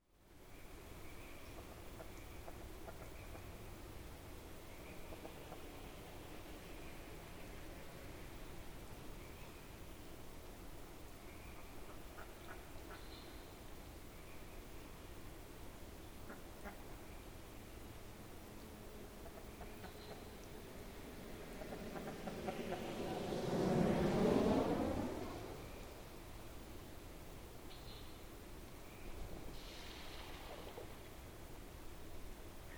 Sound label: meteor